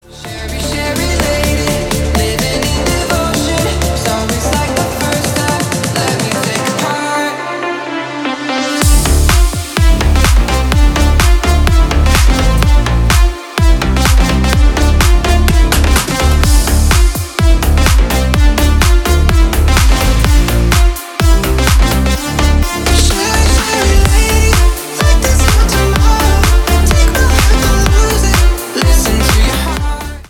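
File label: club remix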